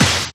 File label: Snares; Vengeance - Essential Clubsounds Vol.4